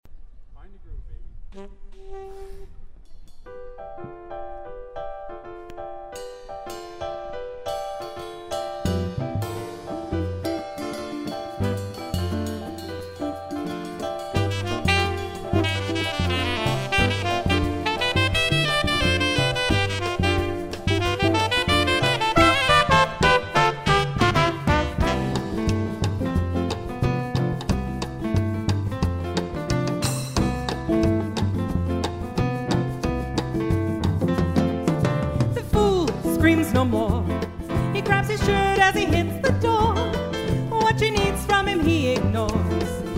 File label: Shaker